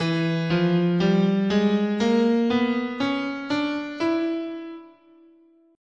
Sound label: E Diminished Half Whole, scale